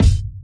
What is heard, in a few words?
kick